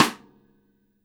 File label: rim, rim shots